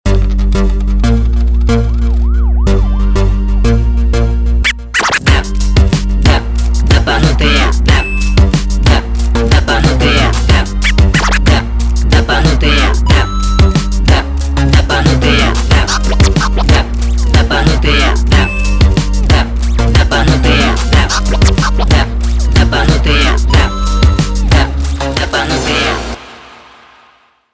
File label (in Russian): rington